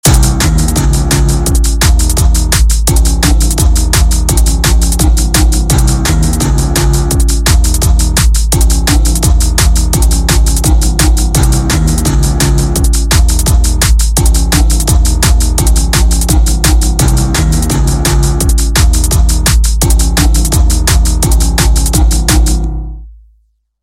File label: Bass